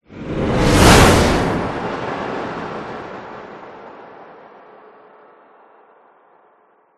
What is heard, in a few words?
whoosh; orlando lanzini